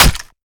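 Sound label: melee character gun medium steel; viper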